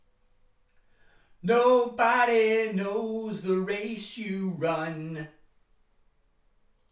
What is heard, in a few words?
vocals